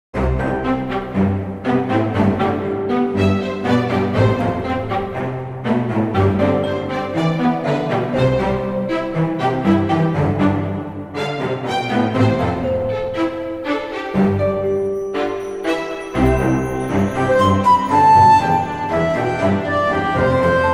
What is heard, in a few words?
Optimistic Orchestral Thingy